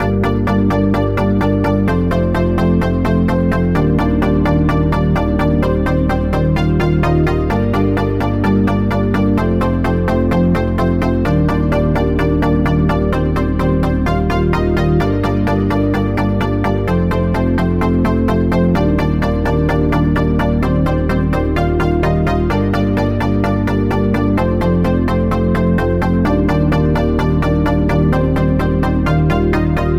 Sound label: House Chord Loop - 128 BPM G Min; Synths; Loops